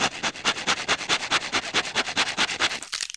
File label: sawing